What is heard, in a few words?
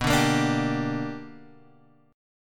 B Minor Major 7th Sharp 5th B down Guitar Open G